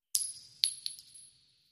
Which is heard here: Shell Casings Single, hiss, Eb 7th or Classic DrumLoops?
Shell Casings Single